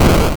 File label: Land